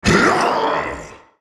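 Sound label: ultimate effort, en US; Draven SoulReaper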